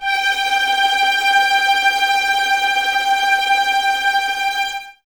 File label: Violins Tremolo G 5; Miroslav Vitous; 11 Violins